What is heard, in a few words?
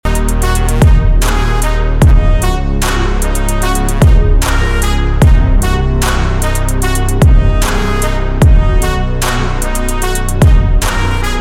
trumpet sample